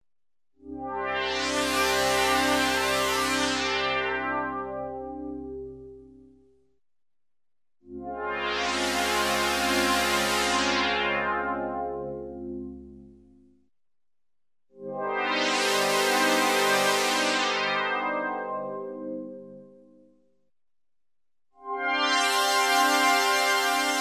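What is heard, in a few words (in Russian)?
аудио эффект на синтезаторе; virisovivanie proyavlenie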